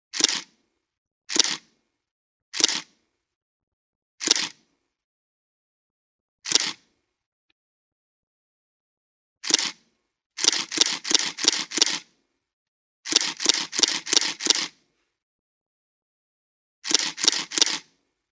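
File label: Pentax 645Z, camera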